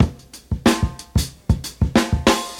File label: 92 bpm breakbeat e key, Free drum groove - kick tuned to the E note. Loudest frequency: 1277Hz